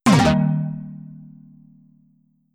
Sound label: wrong